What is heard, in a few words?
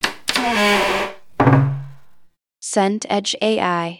horror door slow creak